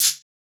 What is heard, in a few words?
Shaker Transient; Drums